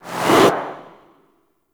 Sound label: Enter Possession; Warframe; Gadgets